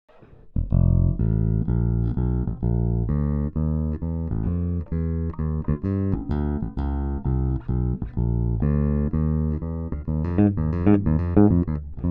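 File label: jazz walking, bass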